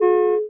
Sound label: beepo